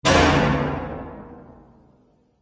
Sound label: Exploding Bang, bomb sound effect; Bomb Sound Effects; Explosion; Bombastic Booms And Battle Ambience